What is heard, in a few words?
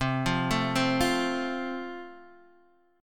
C 6th Suspended 4th C, chord